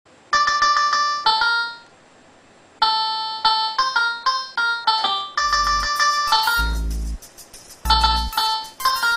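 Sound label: mystery guitar; caue moura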